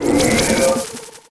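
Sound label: Skill Mana Burn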